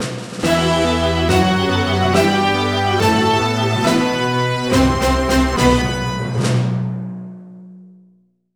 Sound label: Music Sting Pandora's Box Completed, pinball